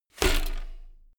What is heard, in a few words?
bmx bike land impact rattle